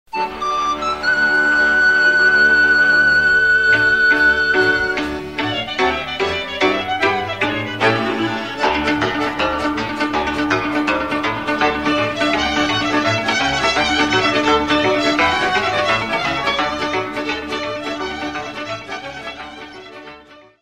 Sarba de la Balti, Roumanie

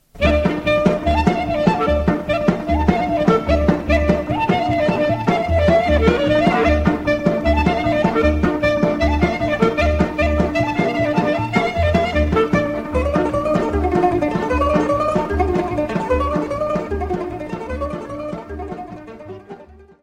Hora Cobza, Roumanie

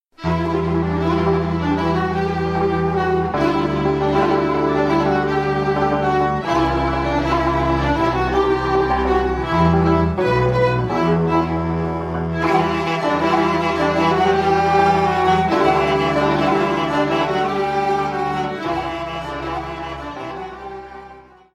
Suita dobrogeana - Roumanie

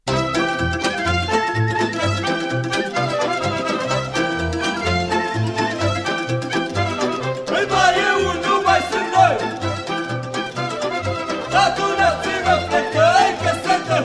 Suite de Jocuri Populare de pa Valea Ramnicului - Roumanie; SUITA VALEA RAMNICULUI

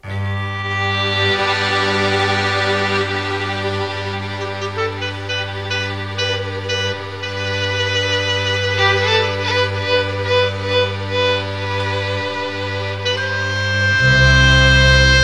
Melodii din Transilvanie (Doina, Invârtita, Hategana), Roumanie

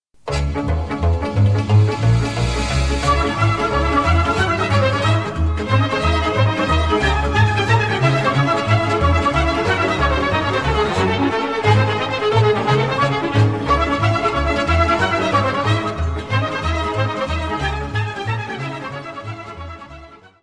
Sirba din Nord, Roumanie

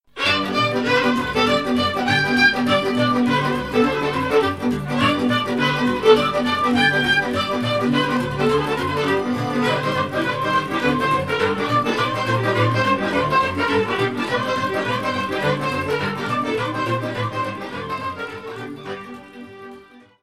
Sirba, Roumanie; Sirba Dogarilor

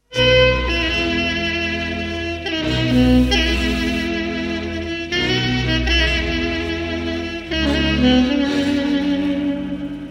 Briul, Roumanie

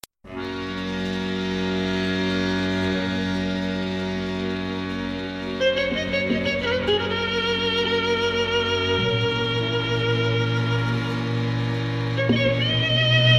Doina, Roumanie

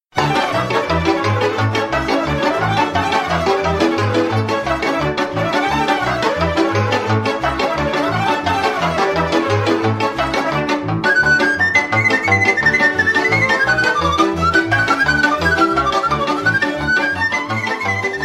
Taraneasca - Roumanie

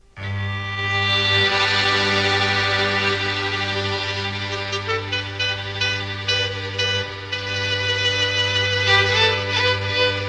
Doina din Transilvanie, Roumanie

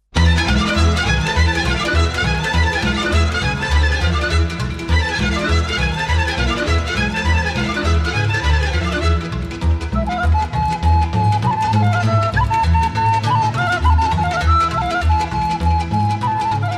Sirba din Oltenia, Roumanie

